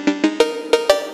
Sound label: phonk message ringtone